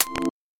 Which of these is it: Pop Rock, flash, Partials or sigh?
flash